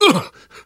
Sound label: hurt minor, survivor voice; namvet; Left 4 Dead 2; left4dead2 dlc2